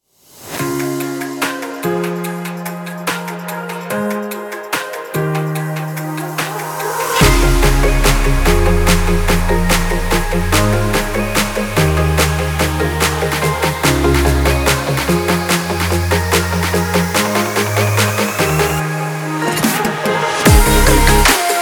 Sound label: future bass, edm, event